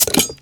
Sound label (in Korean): [Drum] 맥주병; 音MAD; 少女终末旅行; 소녀 종말 여행 음원팩